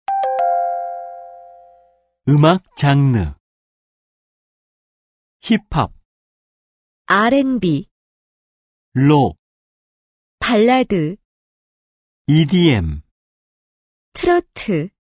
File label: K-POP